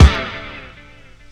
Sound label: hit C, vocal house; PS-28 Vocal House Samples; hits